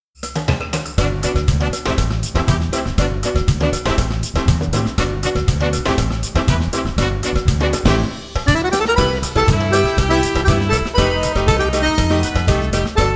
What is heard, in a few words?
Samba de Marilia